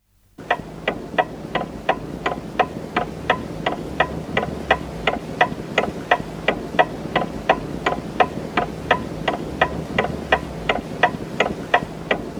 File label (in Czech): hodiny